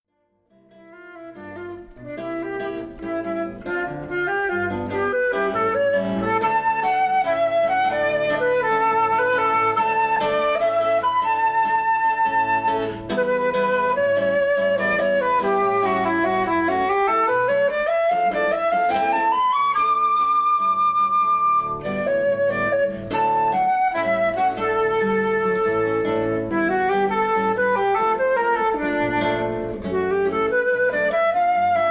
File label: Samba Alegria